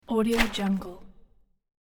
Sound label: transition paper woosh swoosh short high; transitions and movement; ورق زدن کاغذ